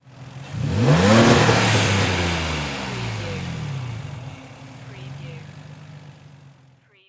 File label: NISSAN 350Z ENGINE SINGLE REV